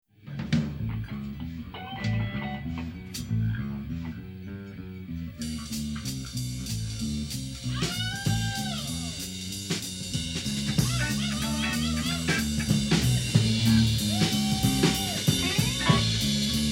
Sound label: Country Jam